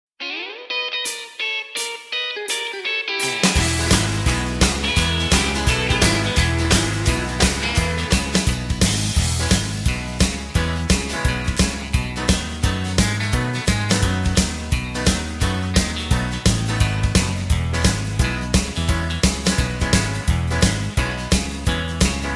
(E) No Backing Vocals

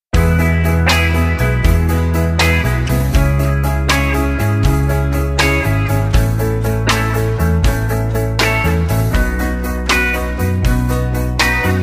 E No Backing Vocals, backing tracks

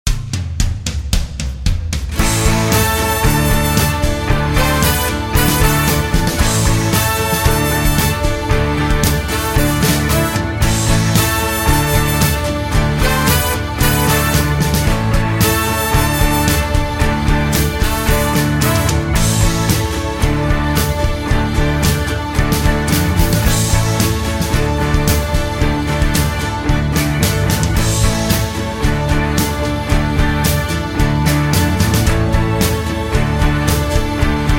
2 (E) No Backing Vocals

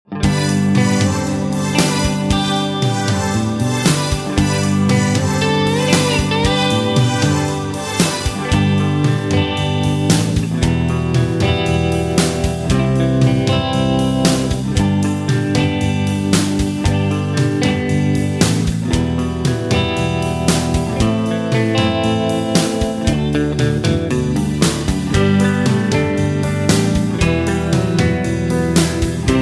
G No Backing Vocals, backing tracks

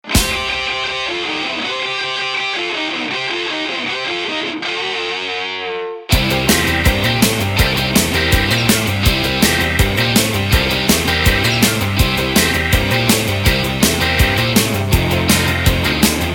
Medley, No Backing Vocals

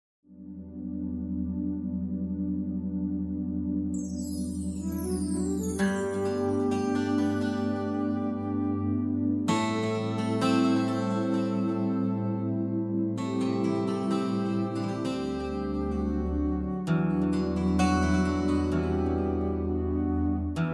(Em) No Backing Vocals

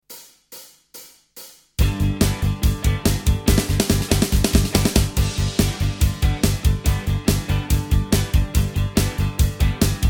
(G) No Lead Guitar

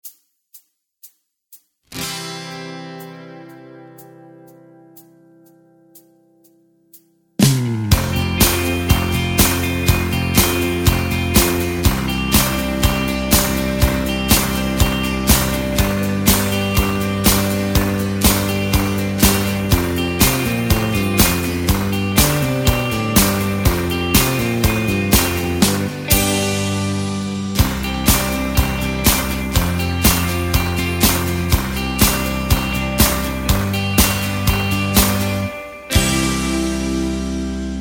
(C) No Backing Vocals

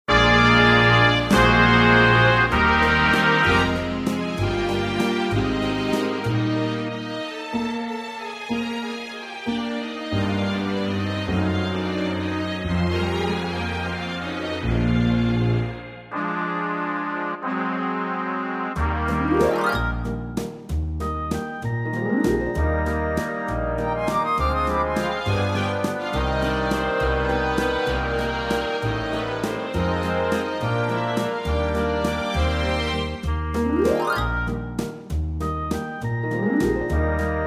Eb No Backing Vocals, backing tracks

